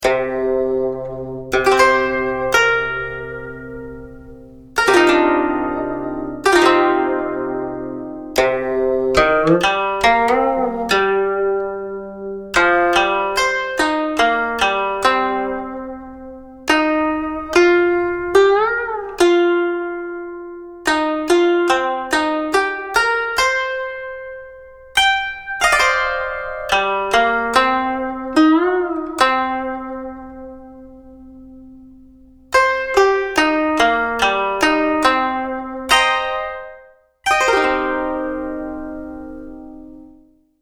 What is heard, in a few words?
Chinese Zither Solo